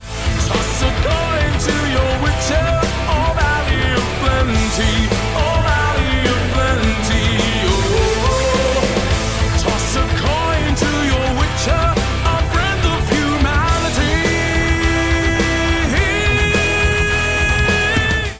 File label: Folk